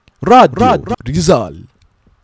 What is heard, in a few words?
stinger; rizal